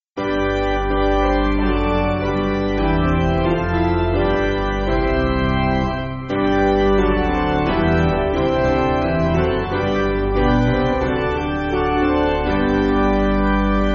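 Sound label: Piano, Music Backing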